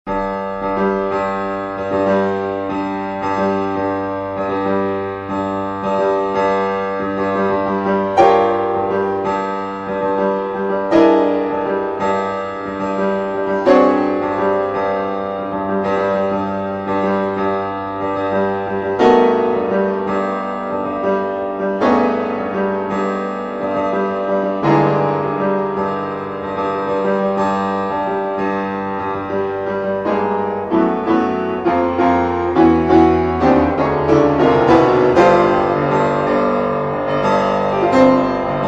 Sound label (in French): PRELUDE EN SOL MINEUR